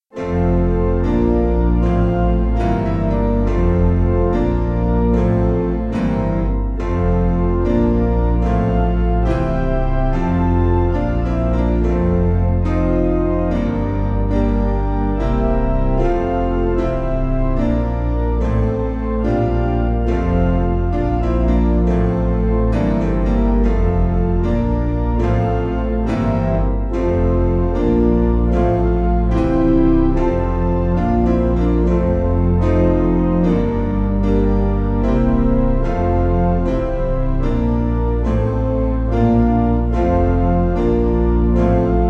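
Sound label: Organ Piano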